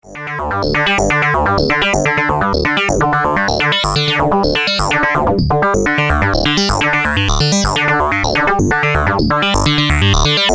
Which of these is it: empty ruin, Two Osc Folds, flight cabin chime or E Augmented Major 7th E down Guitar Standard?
Two Osc Folds